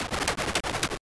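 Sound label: SFX Wolf Attack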